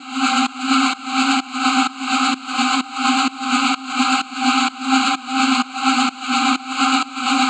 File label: Atmospheric Loop C 128, Melodic Techno; Variety; Ghosthack; AC2024 - Day 12 - Melodic Techno